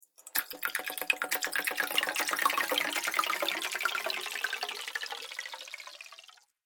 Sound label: Water Bubbling Echoes, cartoon; Cartoon Sound Design Sound Effects; Andrew Fleming